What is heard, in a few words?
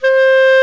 Clarinet & Bass Clarinet